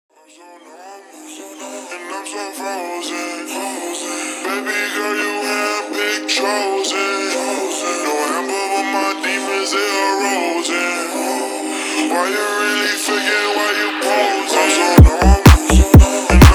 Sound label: bass club avtozvuk, frozen remix